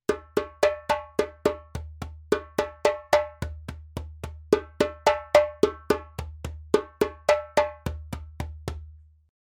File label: djembe; djembebog oevelse